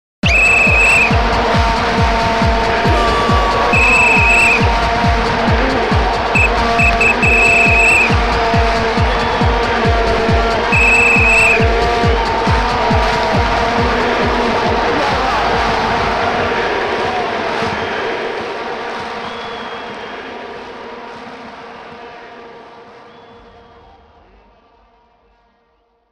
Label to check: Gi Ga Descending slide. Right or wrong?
Wrong